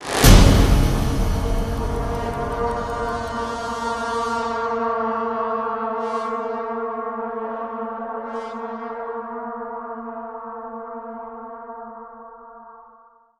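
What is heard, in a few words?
sting power strings perc long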